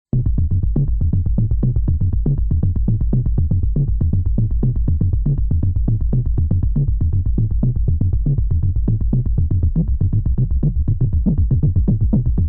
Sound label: Korg Monologue Dark Perc LFO EG